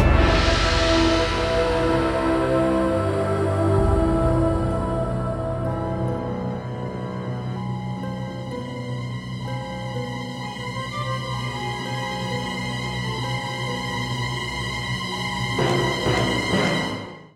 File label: Level Sting